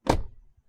90s car door hit

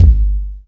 wet kick drum single hit g key; kick drum samples

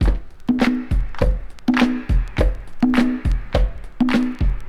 103 bpm high quality breakbeat sample f sharp key; drum loop

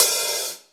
reverb open hi hat f key

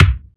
snappy kick drum single hit c sharp key

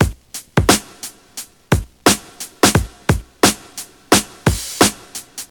87 bpm 2000s hip hop drum loop sample g key

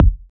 dry steel kick drum sound f sharp key; kick drum samples

